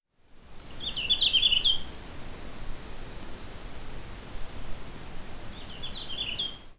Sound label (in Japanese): ruribitaki, bird song; ルリビタキ; さえずり②; 長野県小諸市 高峰高原